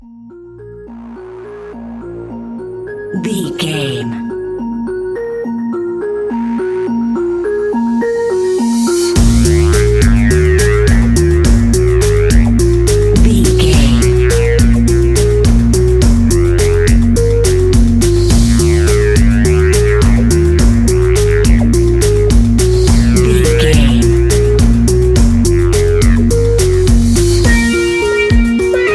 Dark Sad Mysterious Cinematic, A dark, sad and mysterious cinematic electronic track; A dark, sad and mysterious cinematic electronic track, good for film openers, criminal, detective, horror